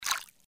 Fishing Caught Empty; LG GD900 Crystal; Game Sound

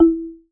Marimba, notifications; Oppo Find X5

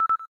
cam timer last

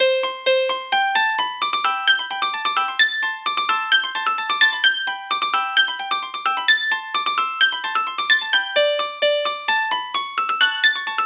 Jig, phone tones; Samsung SGH-i607 BlackJack

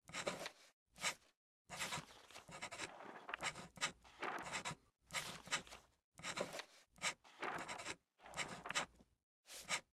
Library, ringtones; Samsung; Galaxy A SHW-M100S

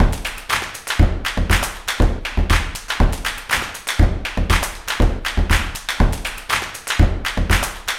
Tap Dance, ringtones; Huawei C8813D

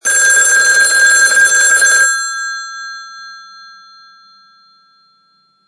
Bell Telephone; Danger Hiptop-LX-2009; Rings

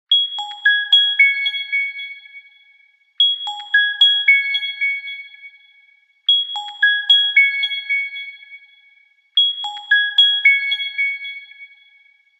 Ring Refraction

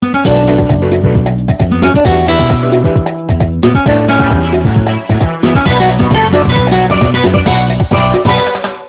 Salsa; Nokia; 6670; Muvee

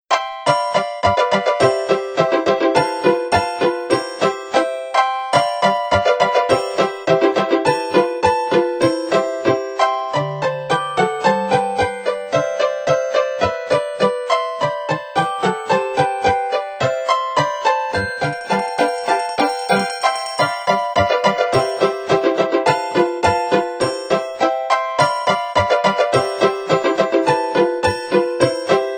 Pizzicato Polka; HTC T-Mobile Shadow

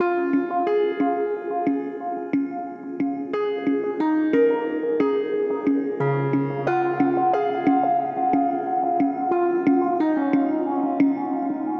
system ringtones abstract

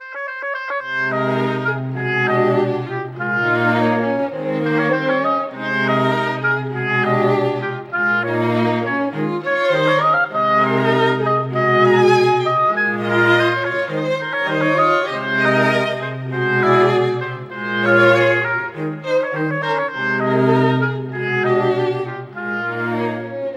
Acacia, ringtones; LG; Wine Smart F480K

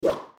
bounce

